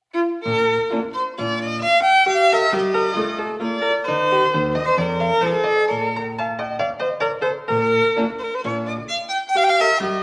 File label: sonata no. 1 in bb major allegro